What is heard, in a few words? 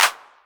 Clap, Novation Drumstation